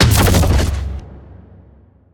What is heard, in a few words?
activate; smite; god raijin